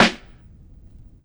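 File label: Vinyl Snare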